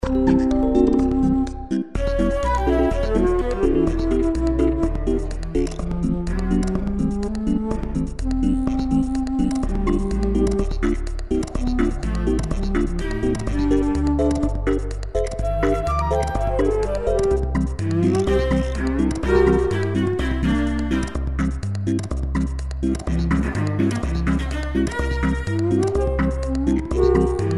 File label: Sing